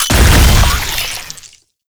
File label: Proto Infested Maggot Explosion; Warframe; Abilities